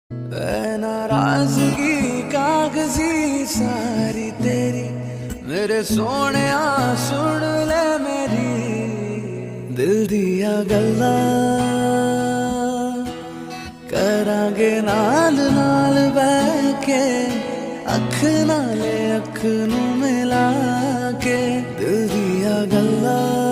Romantic Hindi Ringtone

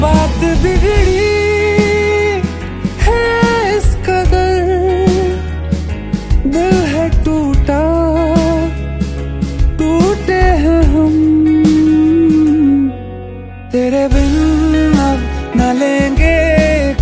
New Hindi Song Ringtone

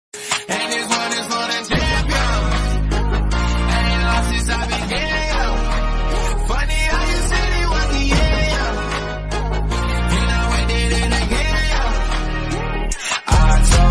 English Song Ringtone

